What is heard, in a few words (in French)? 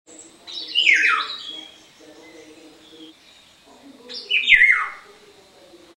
Loriot chant